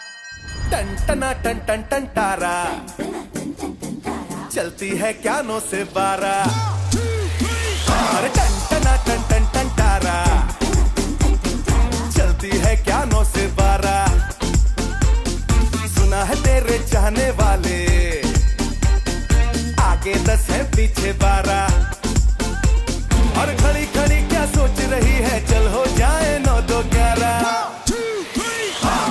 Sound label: Bollywood Movie Song RIngtone